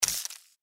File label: mantis foot; npc